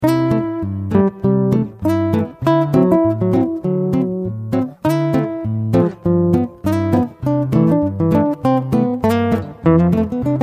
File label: swing